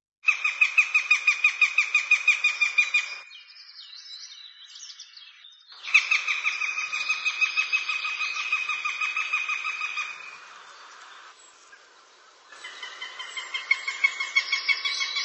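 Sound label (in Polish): jastrząb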